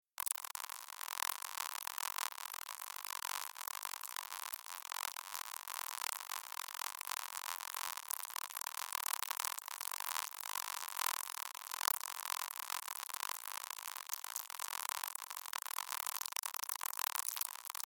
EEG spikes

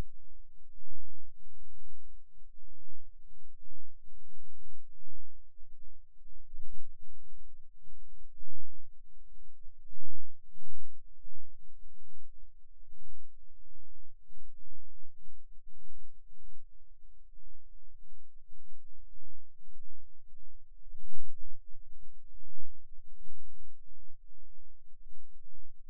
Rhythm Wave, eeg seizures

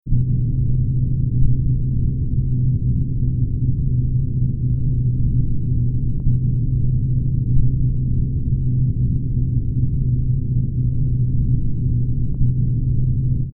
EEG Audif x10

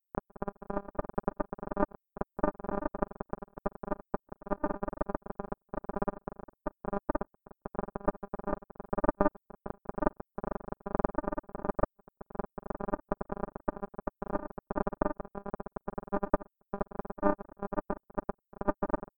EEG

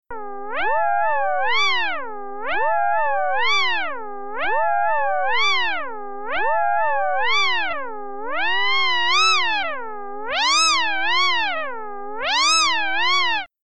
Two FM Sounds Diff To Mod Depth

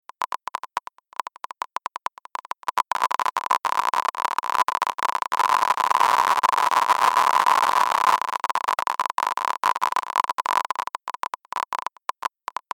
Rain Lo Mid Hi Mid Lo

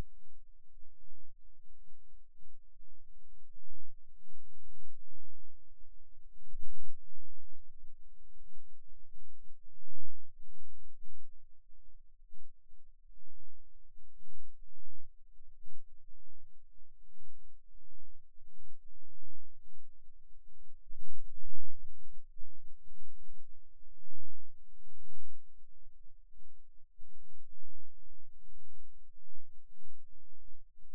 Rhythm Wave, eeg seizures

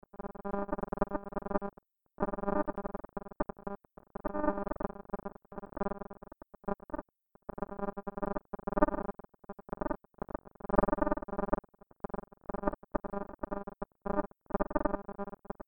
EEG autocorr 10Hz

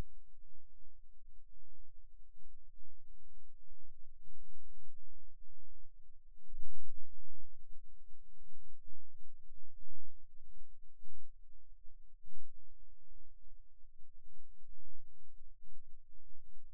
Rhythm Wave, eeg seizures

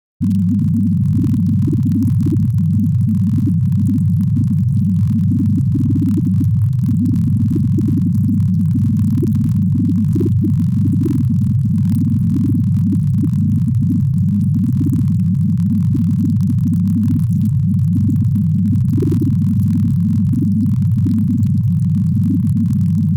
EEG phase Son

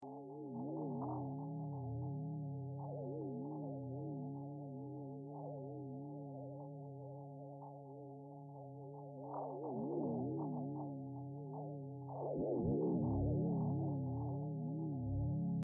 EEG Realtime

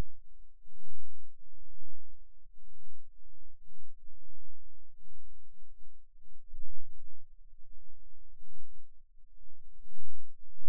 Rhythm Wave, eeg seizures

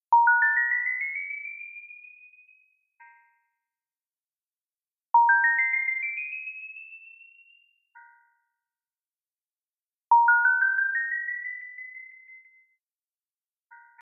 melody, baryon spectra

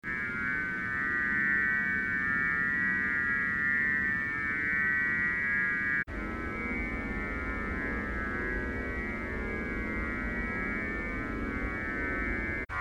Materials Noise Beech Birch Oat Rice; biomaterials

